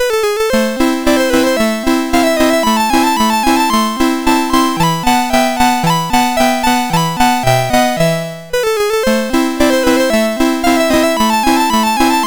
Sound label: Alla Turca, MOS6581R3